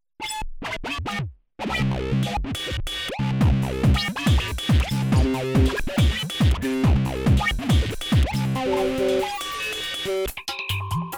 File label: yamaha dx 200